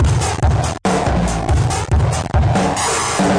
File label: 142 mangled deathdrums; 141 to 160 bpm